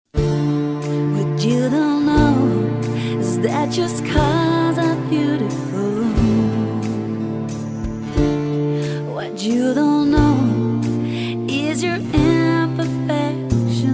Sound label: Orchestra